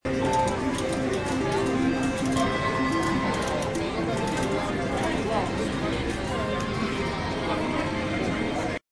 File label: sounds of vegas